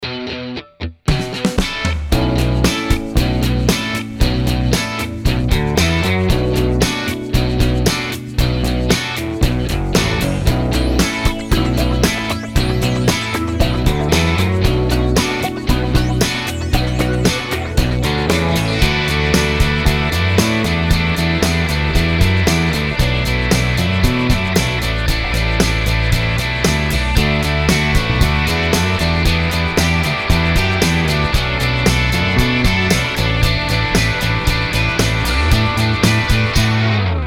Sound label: P pick mix